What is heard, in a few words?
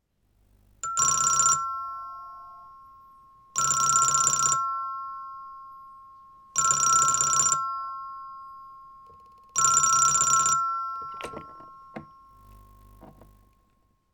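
kuro denwa kakaru, black telephone ringing; Chino Cultural Complex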